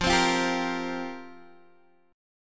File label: F Suspended 2nd Suspended 4th, down, Guitar, Open G